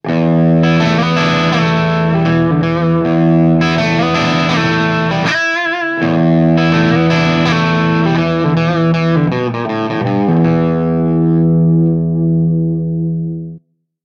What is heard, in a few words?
Classic Rock, ringtones; Marshall; London KB 1501